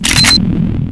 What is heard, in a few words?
snip act, sniper; zaero; weapons